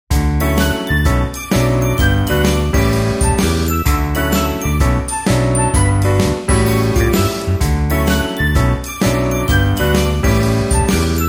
日傘ガール

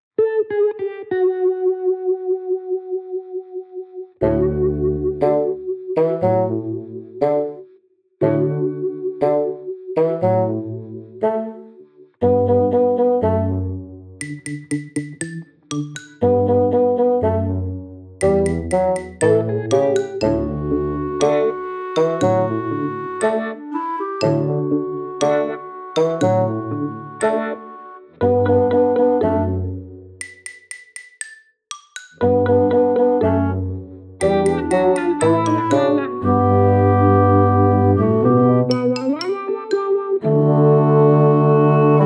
やる気がない曲, コミカル

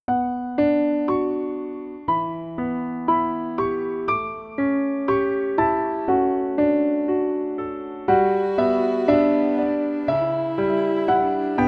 涙の曲

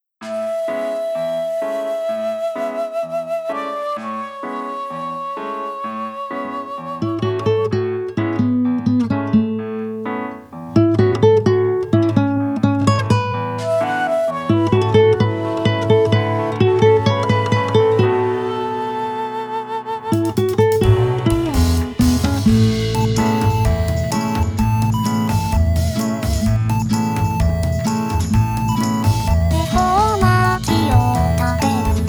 恵方巻き; 初音ミク

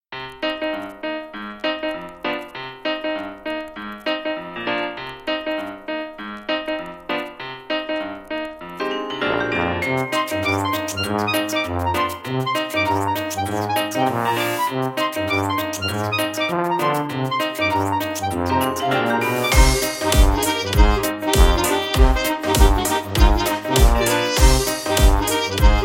エレクトロスウィング; electro swing

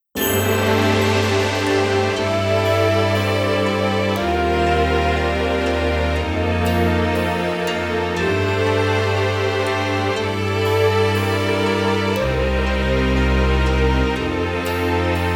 仙境の伝説

